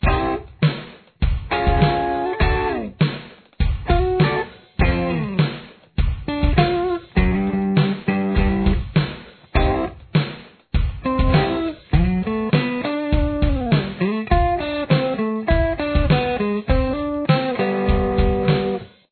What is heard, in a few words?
chorus guitar